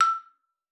XPLA E5, XYLOPHONE; Xsample VOL-4

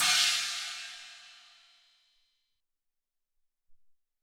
Chna Cym, Alesis SR-18

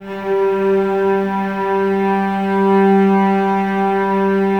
Cellos No Vib G 3, String Ensembles; Miroslav Vitous

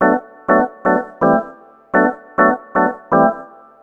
Organ chops (125BPM), retro house

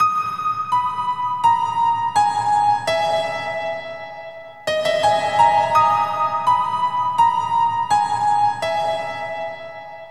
PNO, Drum & Bass; Best Service ProSamples vol.03 - Drum & Bass 1; AKAI

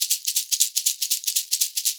120 SHAK, Percussion Loops

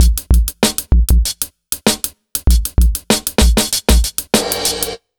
97 BEAT, Underground Hip Hop; USB Soundscan vol.02; AKAI

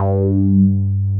DIGALO G3P, DIGALOGBS

